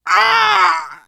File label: hurt critical